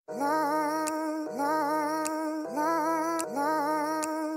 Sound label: Blackout Loop